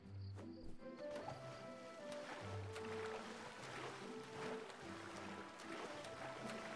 schwimmen; spiritfarer

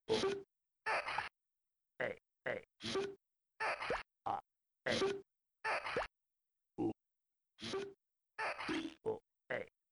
schildkroete wechselt richtungen; jazz jackrabbit 2

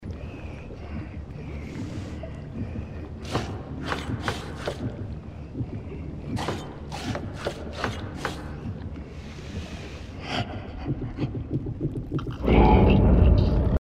herzklopfen; Little Nightmares; gamesoundopedia

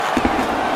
puck wandabpraller; national hockey league 2019

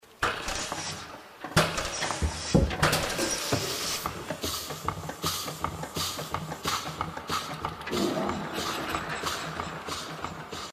feedback pumpe; inside

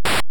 skiing richtungswechsel

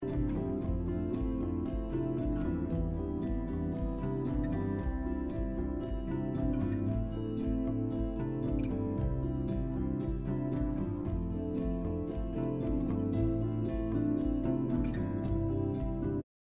schulhof musik; life is strange

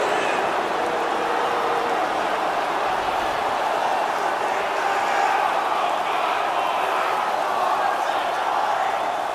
zuschauer wuetend; national hockey league 2019

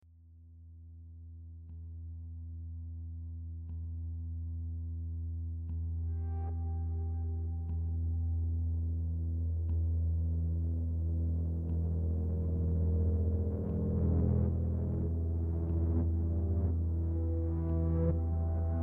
angespannte musik motel; Life is Strange 2; gamesoundopedia

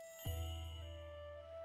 quest abgeschlossen; spiritfarer

